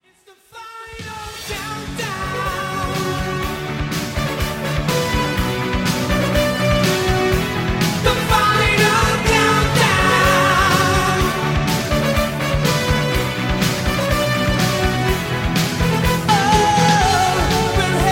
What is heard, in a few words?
English Ringtone